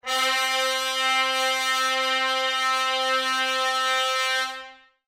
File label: Trumpet